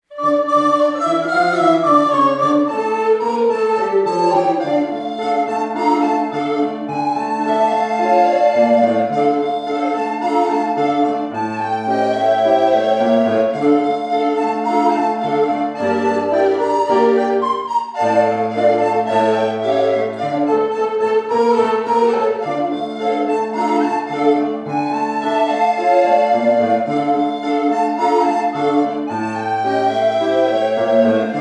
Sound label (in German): Tango